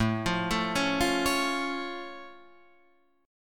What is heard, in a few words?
A Augmented A down